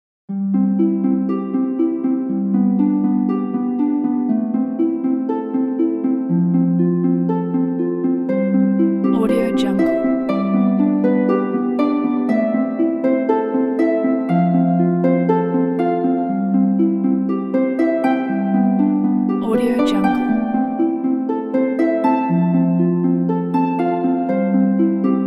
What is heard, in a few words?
harp stars